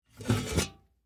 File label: Flower Pot Up, Household, Flower, Pot, Pick, Up, From, Wood, Metal, Container, Full; BlastWave